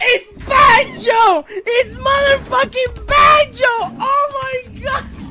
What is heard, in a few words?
banjo